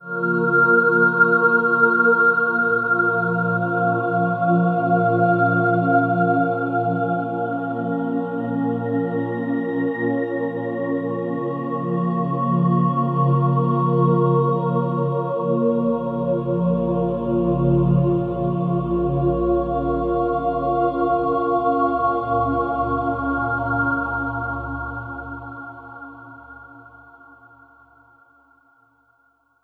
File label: Drone